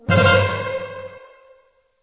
PVP Flag Taken; Spells

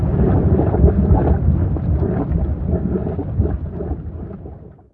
Drowning Loop; Spells

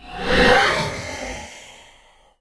Ghost Aggro

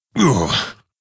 Wound; Halion

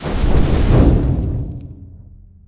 Maim Impact; Spells

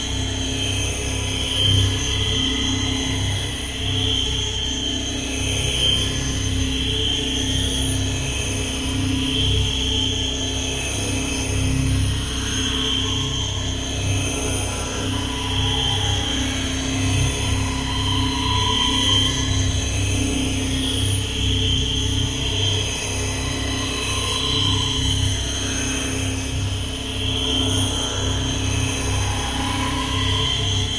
Forge Iron Press Stand; Doodad

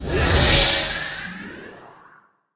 Arcane Golem Small Wound

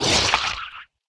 Crypt Fiend Wound

